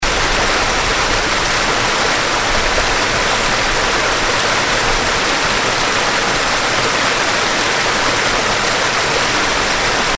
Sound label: Lost Lake Falls